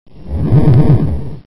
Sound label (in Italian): suoneria botton; beep